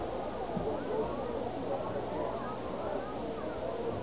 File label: song; vchat; PracticeArea